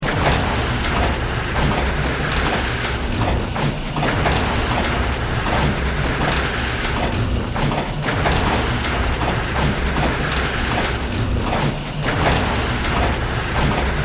Steampunk, Device, Conveyor, System, Science Fiction, Loop, 5.1